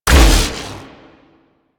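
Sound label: Madurai Contamination Wave Projectile Impact A; Warframe; Focus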